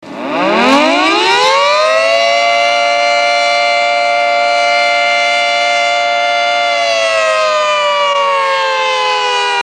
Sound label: air raid